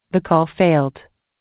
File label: error; baresip